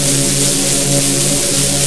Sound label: suit charge